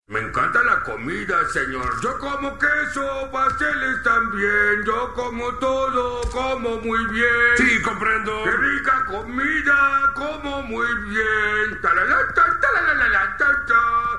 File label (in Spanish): Homero cantando; latino